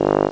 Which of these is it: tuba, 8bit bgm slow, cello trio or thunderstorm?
tuba